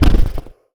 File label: crush